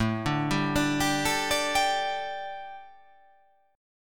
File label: A 7th Suspended 4th, A, down